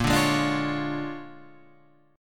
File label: A sharp 7th Sharp 9th A sharp down Guitar Open F; A#7#9 strummed; JGuitar